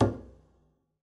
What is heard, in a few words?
pizz noise mute g, double bass; dsmolken; noises